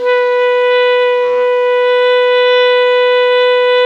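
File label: ALTO pianissimo B 3, Sax; Giga Samples Collection; ALTO SAX PP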